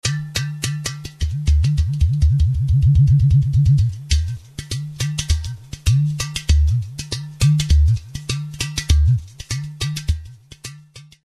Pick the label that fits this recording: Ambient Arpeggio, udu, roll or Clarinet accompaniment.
udu